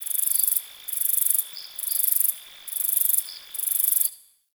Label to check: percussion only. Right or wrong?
Wrong